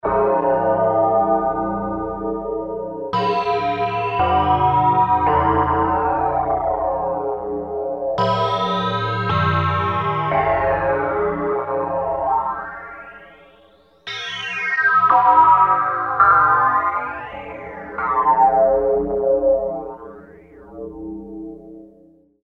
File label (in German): glocken